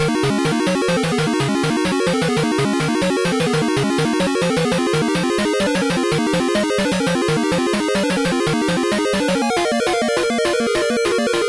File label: Boss Music; power strike ii master system